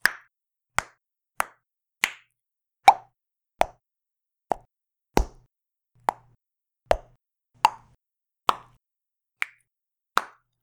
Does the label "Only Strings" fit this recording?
No